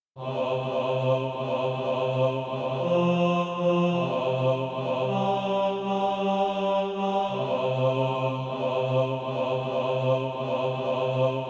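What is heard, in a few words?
bass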